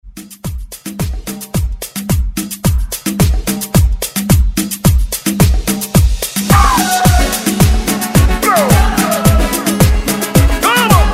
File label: Moombah Mixshow 109; Redrum